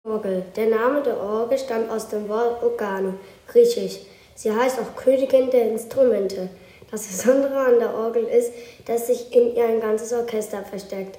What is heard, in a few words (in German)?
Orgel